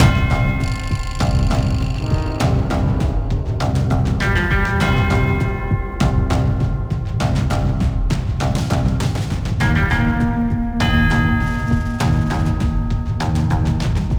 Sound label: Stadium End Fight, gamerip; dying light the bozak horde